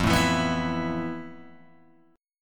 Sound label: F Major Sharp 11th, Guitar, Open G